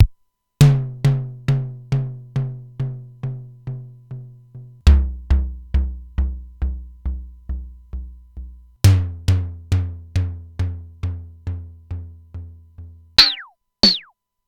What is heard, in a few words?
Simmons SDS-9 Factory